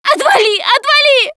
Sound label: scream while pounced, russian, Survivor Voice Producer